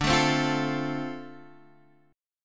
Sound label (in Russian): Boj Dm7